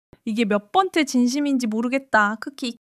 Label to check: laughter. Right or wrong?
Right